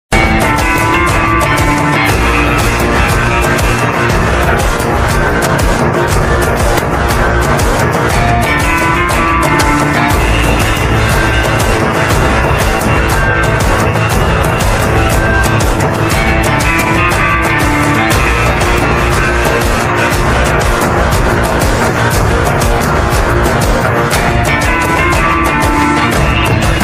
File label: bgm ringtone; pathu thala